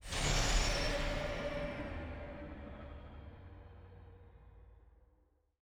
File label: spin Start